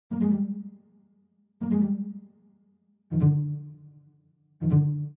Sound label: cello riff Cm, Cello loop Cm 160 bpm.